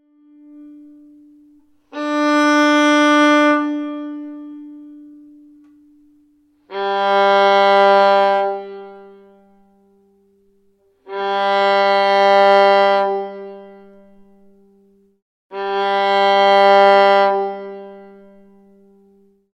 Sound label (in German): stimmtoene